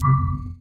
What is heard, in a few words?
Button; CosmicCrazyCash; ClubFusion